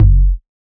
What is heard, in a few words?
verby bass drum one shot F sharp key; kick drum; kick drum samples